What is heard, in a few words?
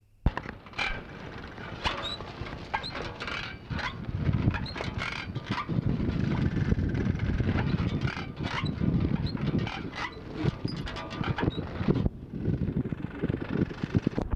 bike